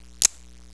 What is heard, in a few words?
snap, plant